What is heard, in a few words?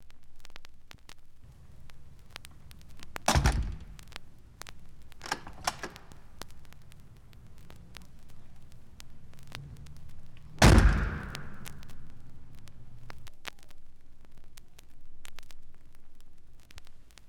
Door Closing, Opening, Slamming